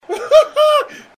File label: haha